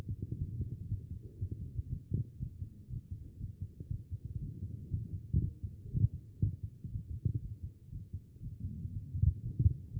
CHEST; B MODE

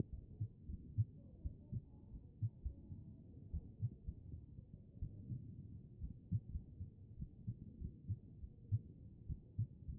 HEART; B MODE

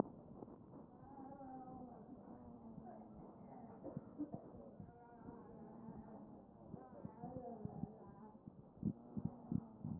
A CHEST

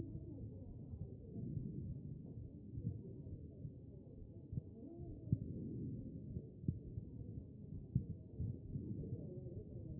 CHEST pos4 B MODE

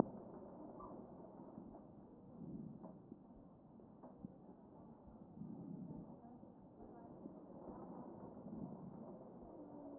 chest

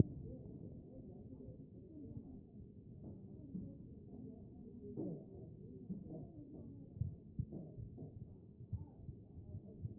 A CHEST; B MODE

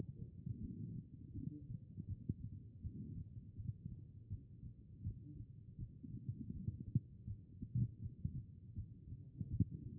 HEART B MODE

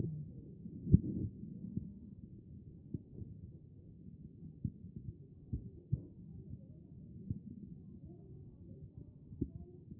CHEST pos4 B MODE

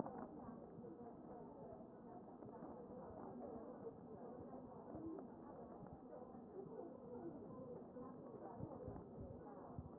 chest

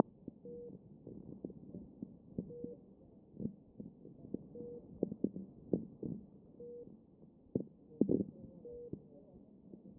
chest

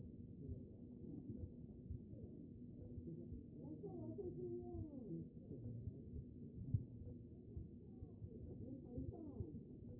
A CHEST; B MODE

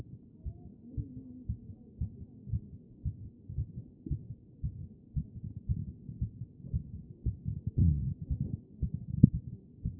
A CHEST; B MODE

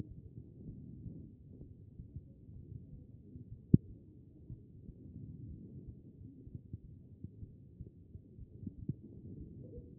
chest; B MODE

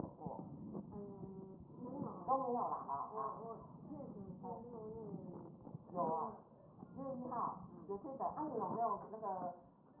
A CHEST

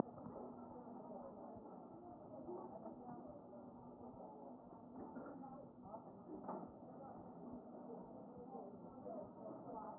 chest

